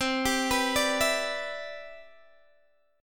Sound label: C Major 9th, Guitar DADGAD